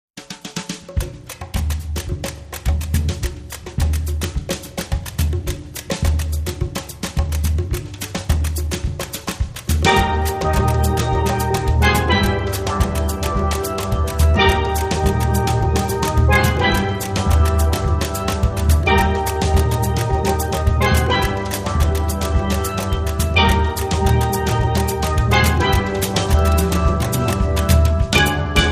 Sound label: Samba